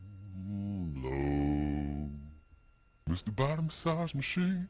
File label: ooohhh body massage machine; gijoe